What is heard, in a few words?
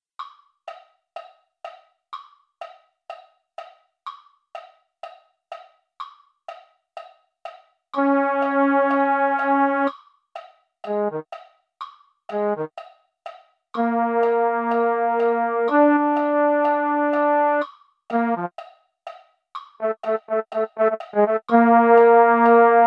baritone; concert corps